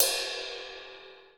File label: ride 1, drum kit; KITS-SMALL